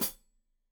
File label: Hat Foot, Hi-Hat, Cymbals Stereo